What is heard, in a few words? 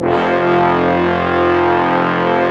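Brass Orch Ensemble; Roland L-CD702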